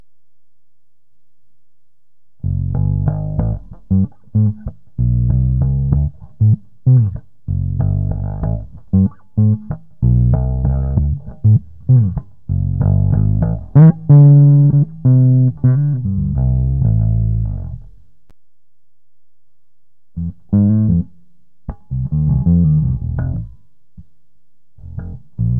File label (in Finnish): basso